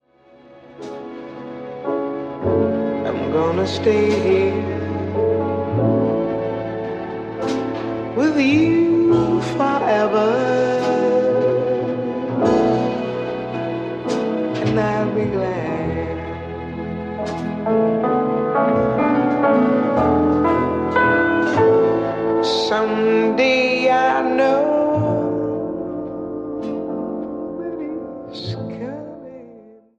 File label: with strings